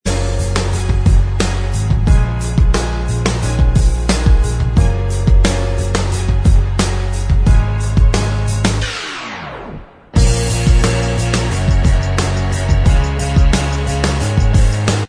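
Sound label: instrumental track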